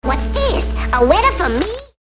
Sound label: Mail